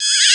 Jungle Loop; Mo' Phatt; Digital Sound Factory Mo' Phatt

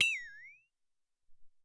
Flex Tone

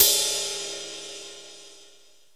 brush ride, Jazz Brush

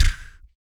VOC PERC, Vocal Planet Jazz FX BASS PERC

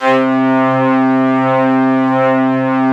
ST-ORG, STRINGORGAN, Yamaha VP-1

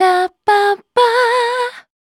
VOC F, Dance Vocals, FEMALE

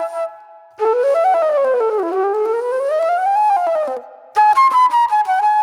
Flute Noir

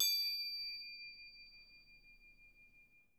Percussion D4, Harp; Miroslav Vitous - Percussion & Harp

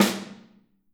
Snare OH; Snare OH All; Drums Percussion

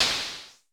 SN Low Punch, Vermona Mono15 Drums Snare

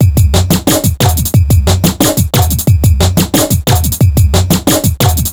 bassline drum 090bpm; Zero-G; Downbeat Dance Grooves

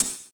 Extract HiHat; Sonic Foundry (Sony Creative Software); Drums & Drones; Construction Kits

